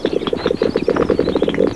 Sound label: snipe Drums